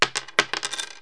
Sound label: Coins Falling, Cartoon; Crashes & Crushes